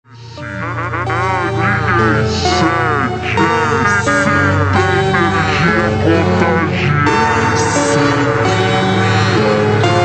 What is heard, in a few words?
funk hyper slowed reverb